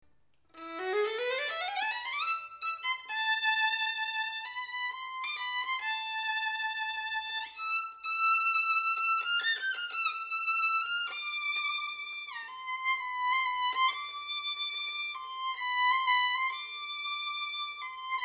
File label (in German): energievoll gereift kräftig abgerundet schön